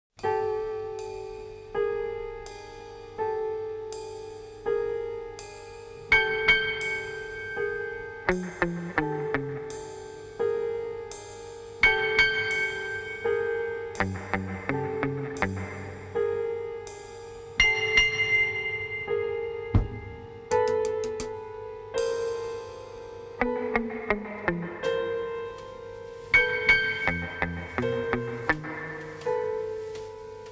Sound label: mini suspense